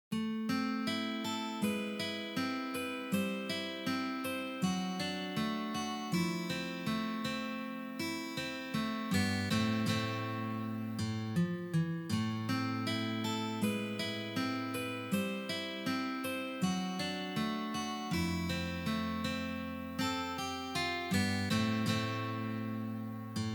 na gitare

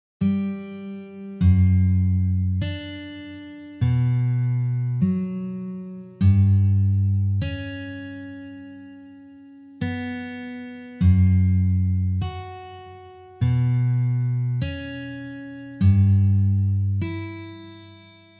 taby dlya gitary; Majnkraft

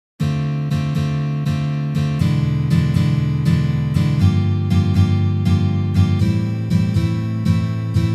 Uprazhnenie dlya igry akkorda Dm7

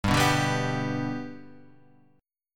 Akkord G lad boj

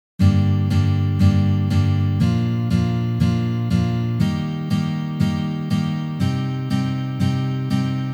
Uprazhneniya dlya igry akkorda Sol minor na gitare

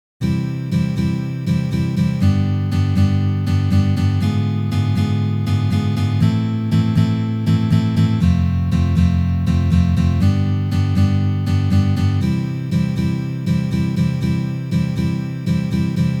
Progressiya s dim akkordami

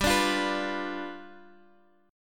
Boj G7

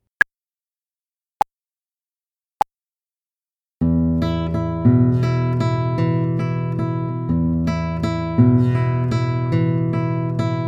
Uprazhnenie Tremolo na gitare

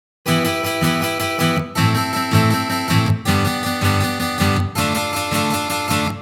CHechenskij boj na gitare

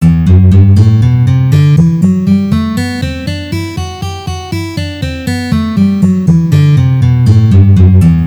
Gamma Sol mazhor pozitsiya

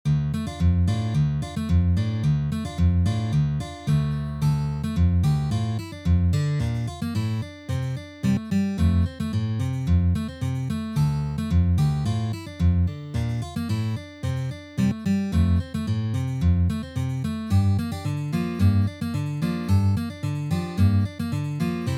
na gitare

